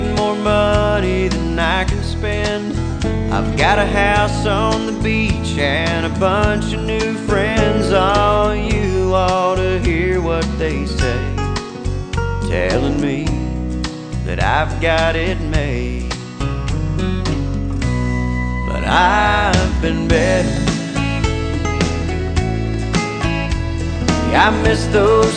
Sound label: country